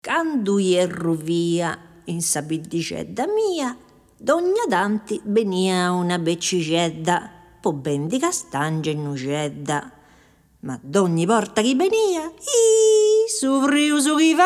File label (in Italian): Filastrocca cantata; Tittia